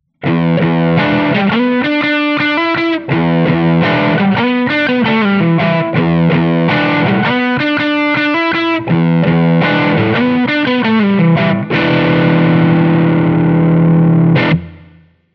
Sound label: crunch bridge series, drive – bridge-PU in series; Manne Ventura